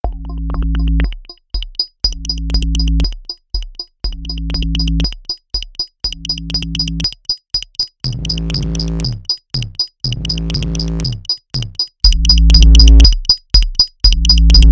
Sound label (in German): Amp Modelle nacheinander